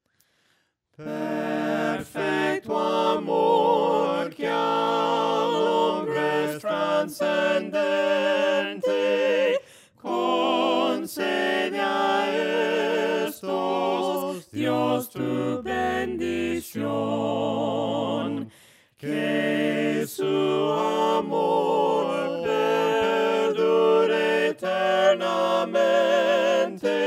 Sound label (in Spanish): Perfecto amor melodia palabras